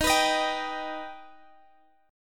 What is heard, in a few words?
D sharp Augmented D sharp Guitar Standard; strummed; capo 9